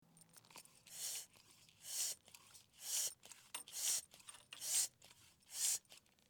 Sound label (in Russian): nasos ruch medlenn